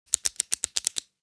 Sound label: Pen office clicking multiple times